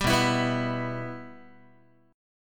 B Suspended 4th Sharp 5th down Guitar; Bsus4#5 strummed; JGuitar

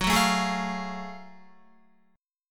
Gb Minor Major 9th, Guitar; GbmM9 strummed; 13,9,9,13,9,13

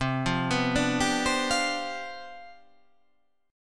C Major 7th Suspended 2nd Suspended 4th C down

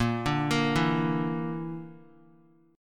Bb Major Flat 5th, Guitar; 4F,4A,5C,5G,5C,6E; arpeggiated

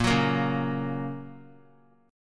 A sharp Minor Sharp 5th, down; strummed; JGuitar

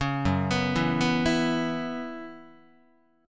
F sharp 7th Suspended 4th, Guitar; F#7sus4 arpeggiated

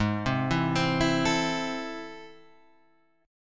A Minor G sharp, Am/G# chord; arpeggiated

